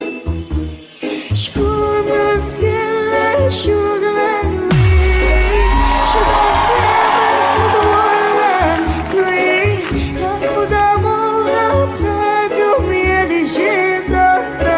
Background Music; Joker

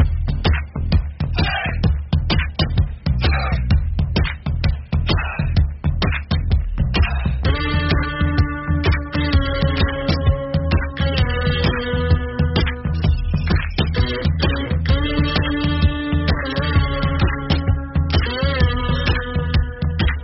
BGM Instrumental Ringtone

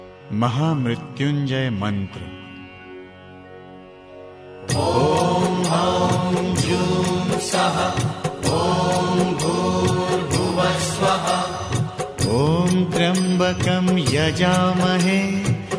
Mahamrityunjay Mantra

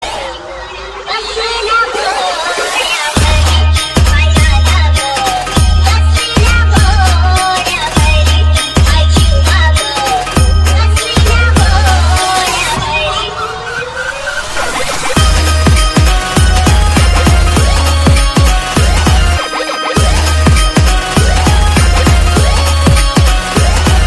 Sweet marathi ringtone

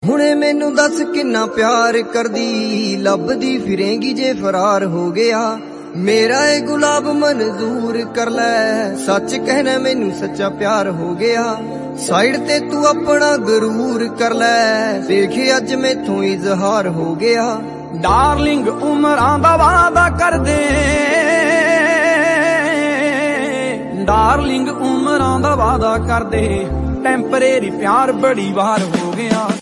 Punjabi Song Ringtone

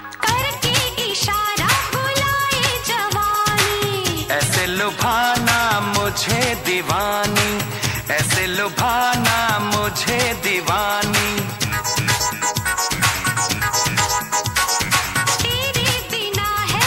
Song Ringtone

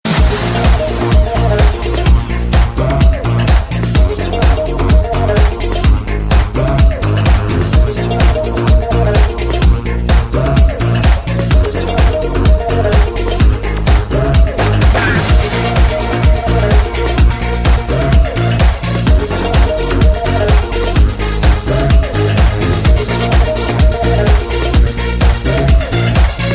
Rock English Ringtones

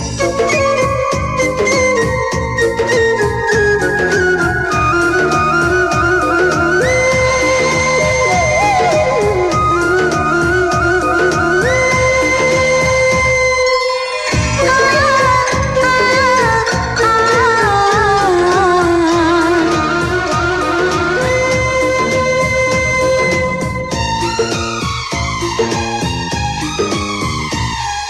Instrumental Ringtone

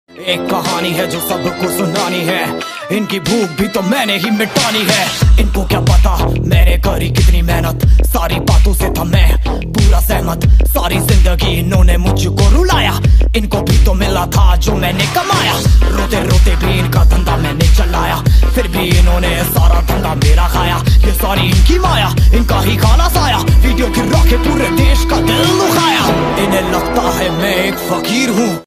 Song Ringtone

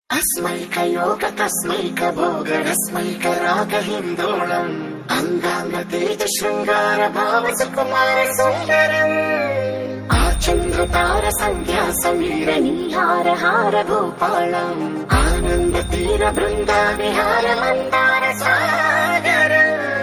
Female Humming BGM DJ

